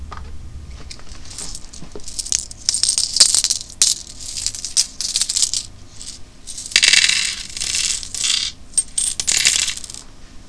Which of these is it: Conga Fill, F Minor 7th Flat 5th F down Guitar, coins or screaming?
coins